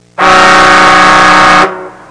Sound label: low boat, alarms; Easy Personal Web Page